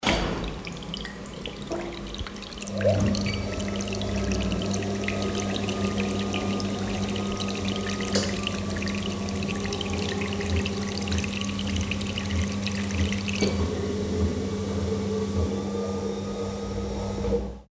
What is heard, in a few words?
spigot and machine noises